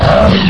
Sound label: attack, ghost; svencoop; custom monsters